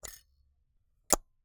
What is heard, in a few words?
Camera, Argus, aluminum, steel, professional, fully mechanical, film compartment, open close; Eiravaein Works; Vaeyan IV